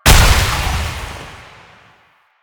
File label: Light Orb Break; Warframe; Eximus; JadeLight